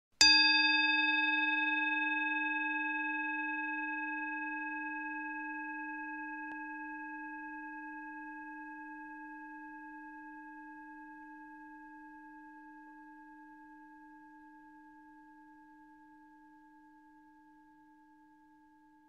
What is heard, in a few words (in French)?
son bol tibetain